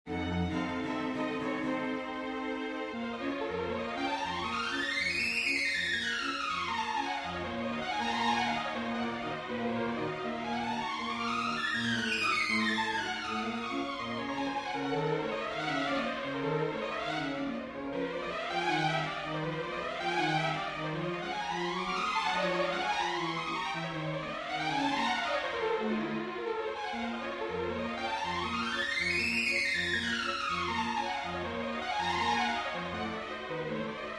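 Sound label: prélude, string quartet; chopin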